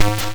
YM Synth D#; ChipShop; CHIPSHOP DELUXE; CHIPSHOP HITS; SYNTHS

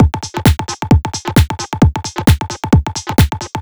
Beat 132BPM (Full), techno; techno drum fx

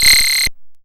YM HP Fly; SFX; ChipShop Deluxe; ChipShop Hits

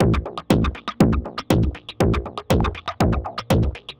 Tech Beat 120 fx, techno; musicradar; 120bpm; Beats

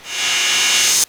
Jomox Cymbal

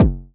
Jomox Kick; techno drum fx; Analogue DrumHits

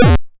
YM Kick, DRUMS

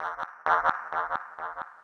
Mid Range, techno drum fx; Single Hits

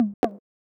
Tom, techno drum

